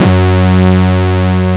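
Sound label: brass G2, SYNTHESIZER analog brass; AKAI S6000 CD-ROM - Volume 1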